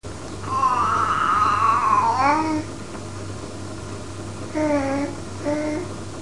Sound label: squeek, baby; 5mnths